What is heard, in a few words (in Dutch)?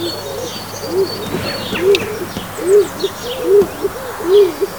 holenduif